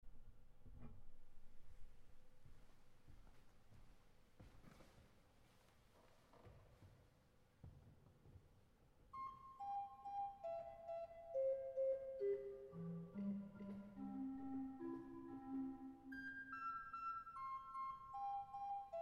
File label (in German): Orgel; Spatzen-Schule